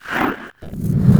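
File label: FX REVERSE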